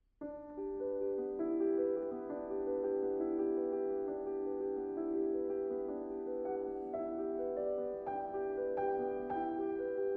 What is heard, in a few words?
Prelude G dur; Rachmaninov